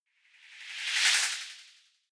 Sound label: whoosh simple flutter phase high